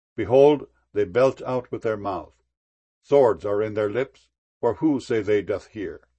belch

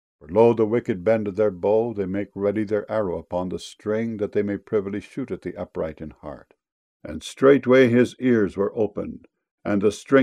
string

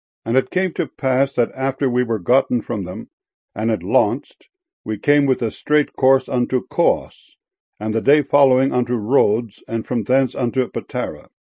Rhodes

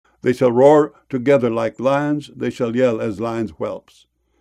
yell

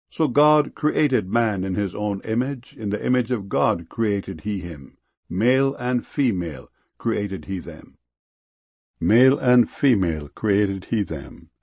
female

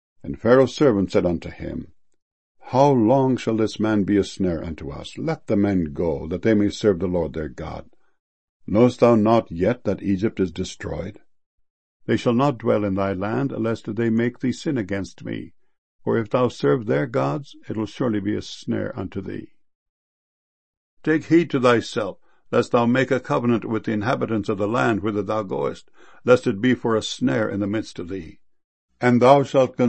snare

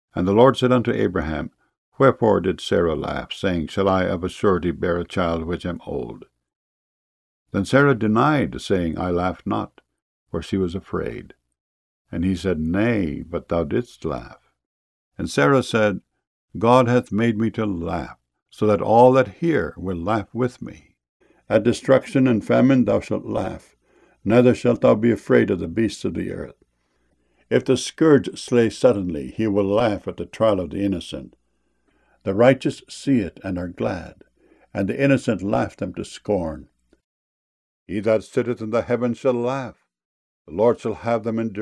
laugh

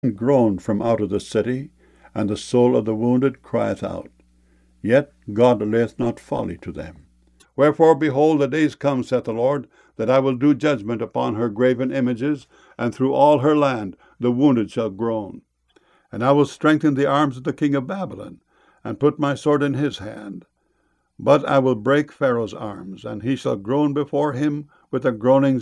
groan

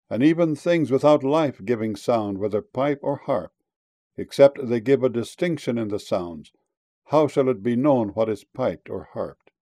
harped

